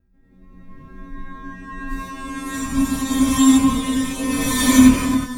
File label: dulcimer; baldis basics